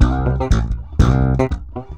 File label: C 120 Steppin Funky